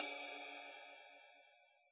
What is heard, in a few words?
ride cymbal, Yamaha DJX Rock Kit